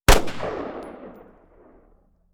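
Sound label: colt ar exterior shot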